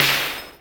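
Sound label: SD, snare drum; X-Static Goldmine 1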